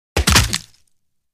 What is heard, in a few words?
Bone Crunch Hard Wet; punches kicks hits bones